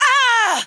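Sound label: female death; WarRock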